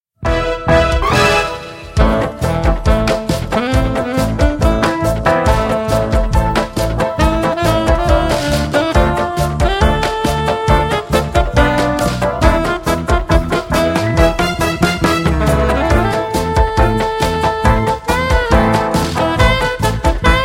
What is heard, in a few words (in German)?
Lied der Schweine Playback